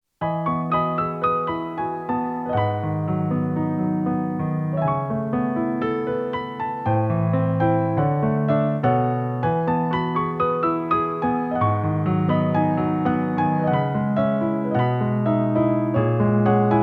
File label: improvisation